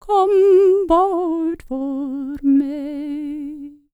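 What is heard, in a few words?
Scandinavian, World Voices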